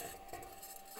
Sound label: mic, mars2020 edlcam